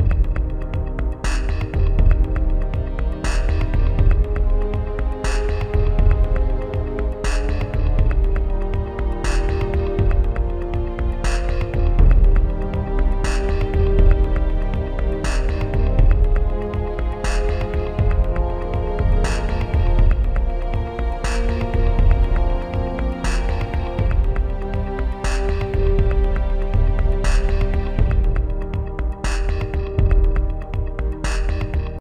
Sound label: Walking Machine